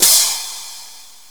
Hand Cym; GMPlay; Drums; TG300B; Hand Cym. 2

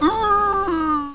pain

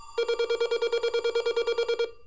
PHONES

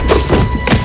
motion sound

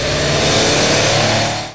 noise

